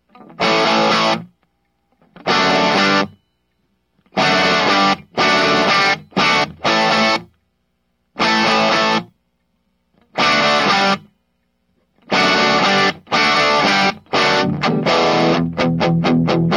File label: 18watter; bridge